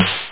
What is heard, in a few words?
909 snare